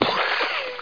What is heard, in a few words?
snorkeler up; austrlia